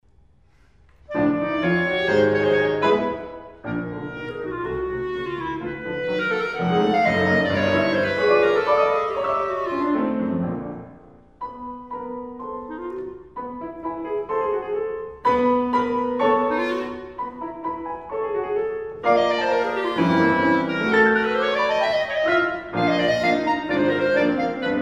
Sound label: Sonata in B-flat for Clarinet and Piano; Max Reger; Op. 107; 2. Vivace - Adagio - Vivace - Piu Adagi